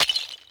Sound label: crystal